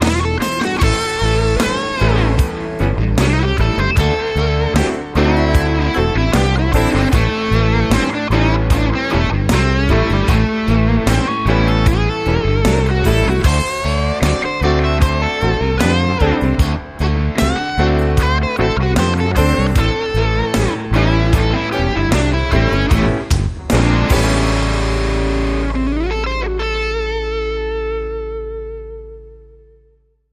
Back To The Blues

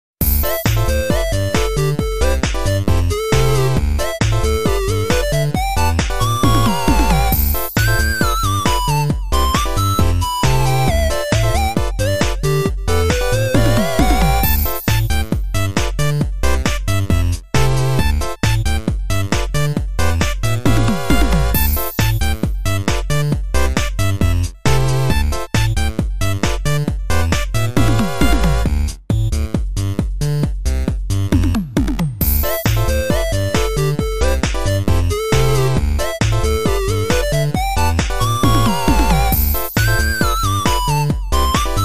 Funk

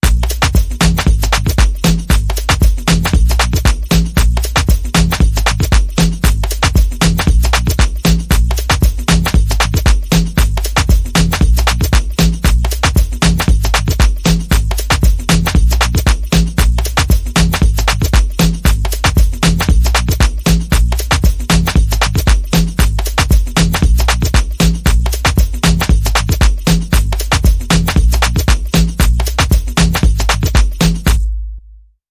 Vox Doubler

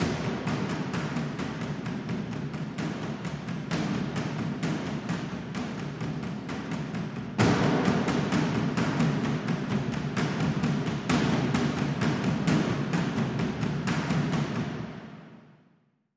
Dhol Mallet

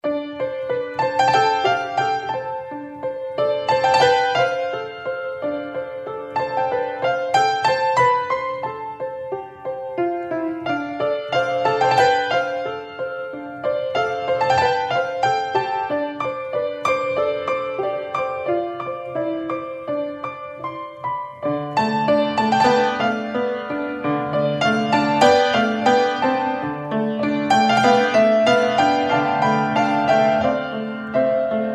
Piano

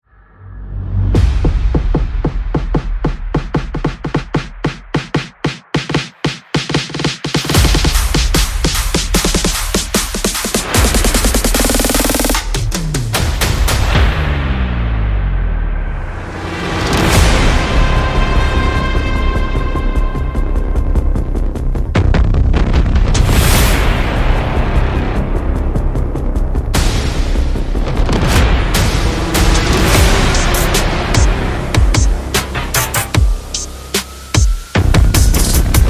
Collateral Damage, No Lead Synths

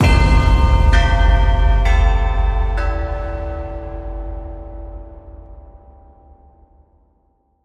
Singing Bells

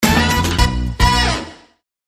Conga King, Sting

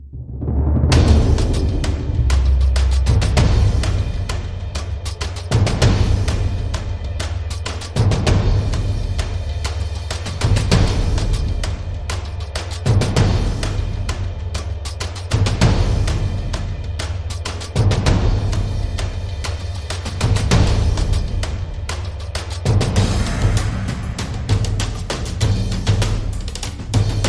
Drums and SFX

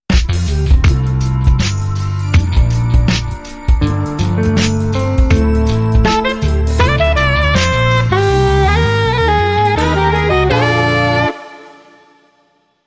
Late Night TV

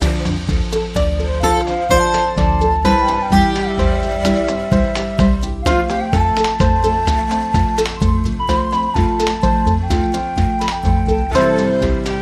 Cochabamba Waltz

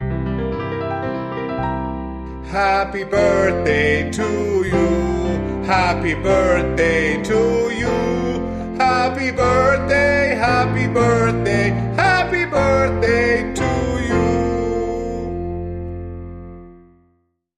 Male Vocal Off Key Happy Birthday

